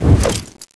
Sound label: dive; svencoop addon; playersounds; ts